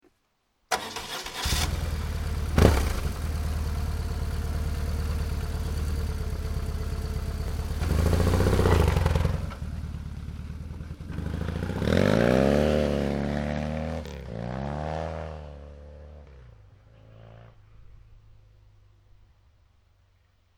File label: BMW R1100GS sound